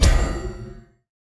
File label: soul siphoning cast; tower grim Wraiths